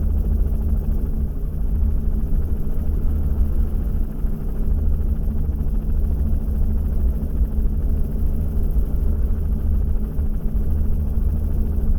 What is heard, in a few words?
Heliloop Interior